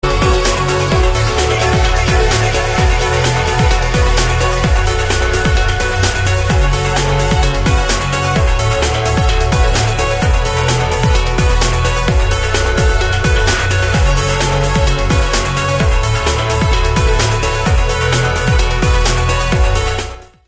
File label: Sad Song